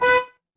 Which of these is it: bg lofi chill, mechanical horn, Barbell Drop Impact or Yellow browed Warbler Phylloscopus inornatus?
mechanical horn